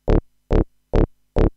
Bass cut; Techno; TechnoMusicMaker

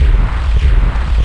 FAN

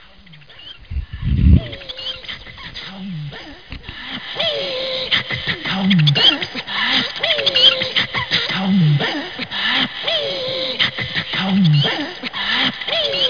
HUM; Doom

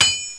anvil; Total Chaos Nukem 3d Pack; duke

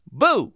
boo; Wired for Sound Pro CD; Aristosoft, Inc.; perot

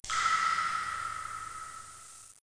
drip

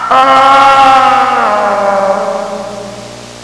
falling; People; Sound Library 2000; Wayzata Technology

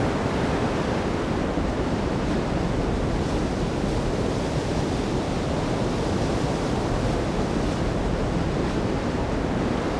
above water; DAoC

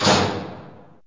Minimize; Wormageddon

